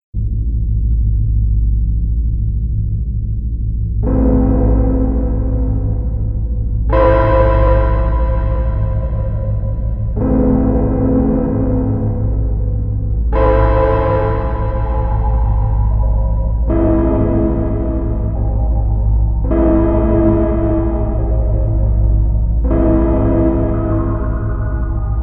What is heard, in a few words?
The Suicidal Clock Chime; Silent Hill 4 - The Room - Score (2004)